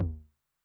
Low Tune Med Decay, 909, MID TOM; classic machines; 909mod; MT - MID TOM

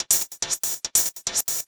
Hat 142 BPM, Club Techno, Drum Loops, Hat Loops; Zero-G

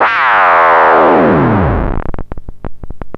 LFO Impact, Analog; Industrial; LFO FX

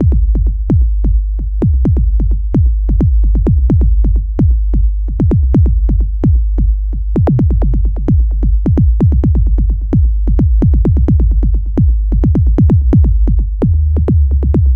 Fill, Drums, Eclectic Electronica

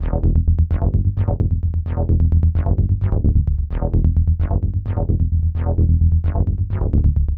Bassline; Drums & Drones

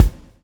SWNG

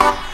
HIT, Hits & Brass

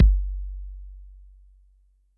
BD ELEC, Electric Kick, Yamaha DTXpress

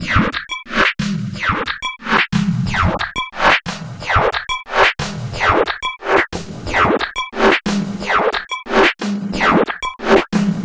steam 090bpm, downbeat dance grooves; Zero-G

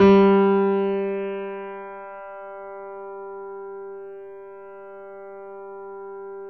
G 2, GRAND P; USB Soundscan vol.17

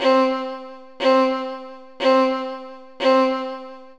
120 C string, Trance, String Loops; Trance Explosion Vol1

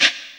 SHAKERS; Best Service; Dance Mega Drums CD2

